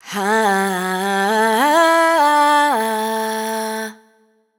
riff Dm wet, Vocal Sample Pack, Raw Vocals, Wet Vocals; Inspo; Slate Digital Omnivox Vocal Sample Pack